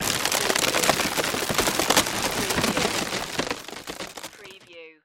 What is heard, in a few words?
Ceiling Crack Wood